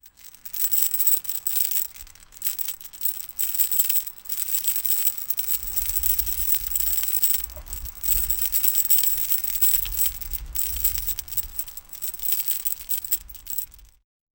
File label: chains rattle intermitten, clank